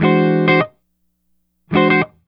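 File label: Jazz Guitar Chords, Dmaj9, 104 bpm; Fusion; Pro Tools Essential Loops; Digidesign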